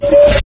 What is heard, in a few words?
ui select; Source Engine; Portal 2